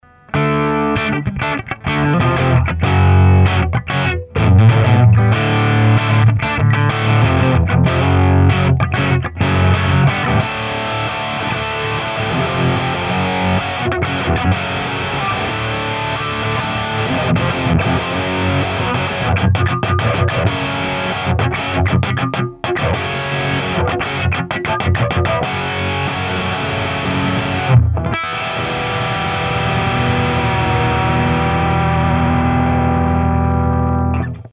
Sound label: clean clean boost; JFET